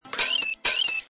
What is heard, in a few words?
cell beep